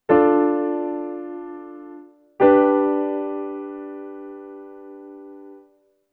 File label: imperfect cadence